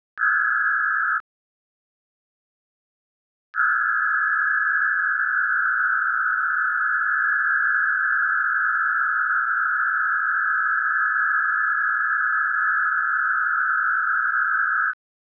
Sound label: R-PR DPSK2